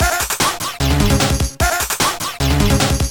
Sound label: beatbox; BLAIZER